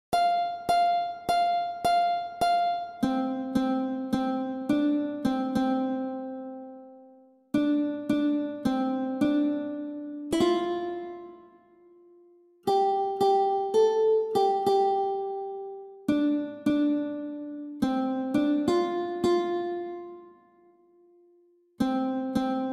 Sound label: country road half piano